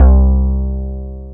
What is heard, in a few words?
KRAFT BASS, ROLAND MKS-80; Rubber Chicken Software Sample Bank